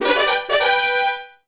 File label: Fan; PS1